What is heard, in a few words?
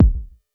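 Club bass drum, Jomox Airbase99, Drum Machines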